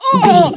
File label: voice female hurt pain